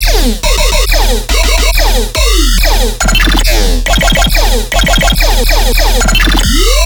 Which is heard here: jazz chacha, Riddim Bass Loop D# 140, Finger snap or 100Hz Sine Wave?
Riddim Bass Loop D# 140